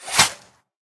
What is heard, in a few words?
Spider bite Attack; Hero Asra